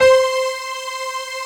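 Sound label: synth C, STAB, House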